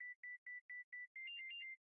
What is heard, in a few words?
Alarm Security breach